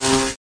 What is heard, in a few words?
electric